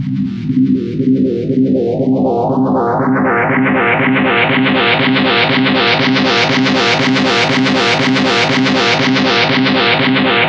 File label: pulsing swell electric guitar 120; Cinematic Trailer Loops